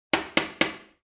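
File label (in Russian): три удара нокером; Звук стука в дверь; Для детей, для детских постановок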